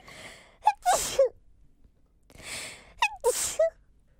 Sneeze

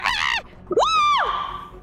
screams

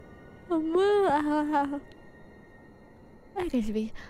Yawn

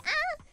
hope noises

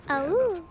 Awooh

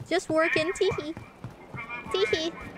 tee hee; IRyS

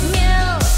meow

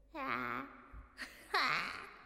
aaah

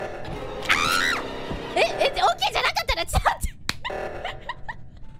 Scream death noise; IRyScream; Among us

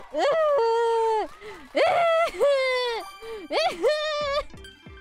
crying

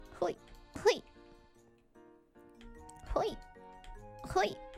"hoi" combo; noises

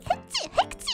fake sneezes; noises

